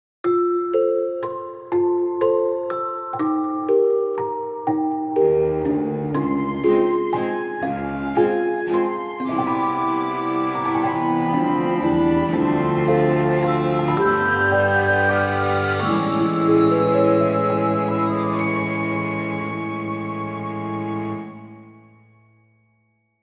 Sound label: theme; Gacha; moonlight